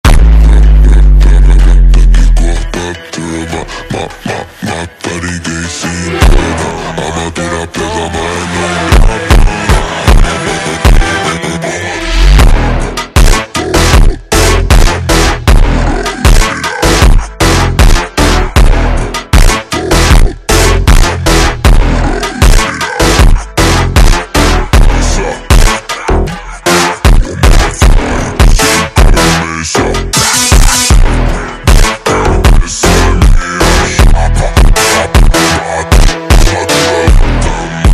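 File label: ultra Slowed, phonk music; funk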